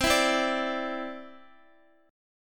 C-Major Add 9th, Guitar; Cadd9 strummed

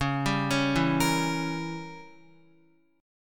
Db Diminished 7th, Guitar; arpeggiated; x,4,7,6,4,6; 4F,4A,5C,5G,5C,6E

